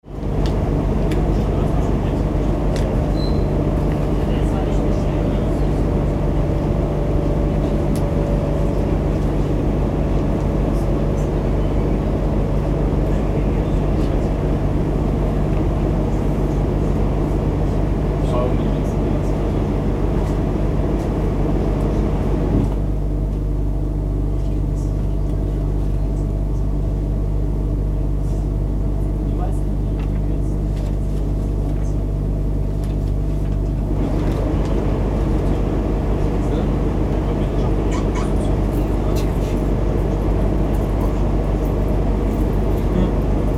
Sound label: train cabin inside waiting